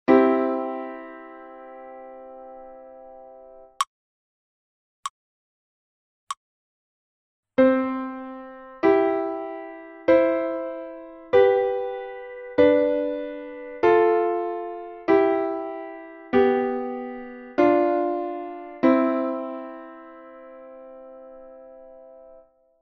Dvojhlasa melodie CEG FAST

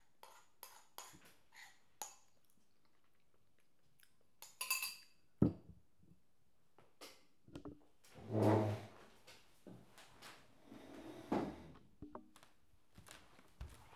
ruch

